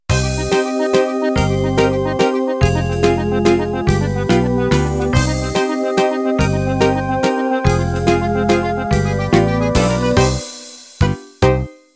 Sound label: Musette End